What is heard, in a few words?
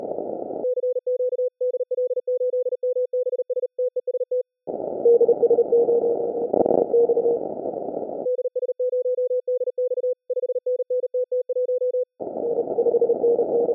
MorseRunner